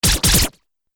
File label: Laser Stun Shots; Laser Gun Sound Effects